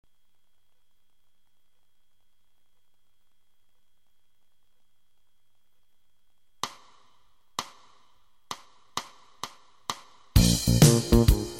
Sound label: Bb Shuffle, Blues Playbacks